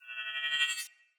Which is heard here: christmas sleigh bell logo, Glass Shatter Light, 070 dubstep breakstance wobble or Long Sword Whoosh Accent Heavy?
Long Sword Whoosh Accent Heavy